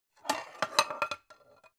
pan handle

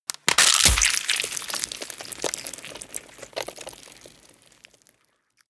gore rip body apart long

